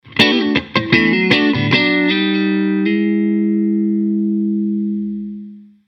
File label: Riff